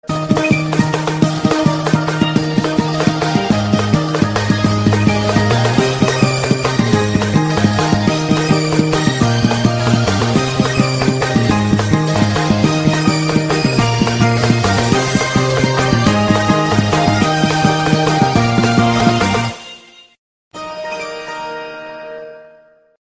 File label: cool samsung remix ringtone